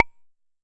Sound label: Jomox MBrane, drum machines; Jomox MBrane 11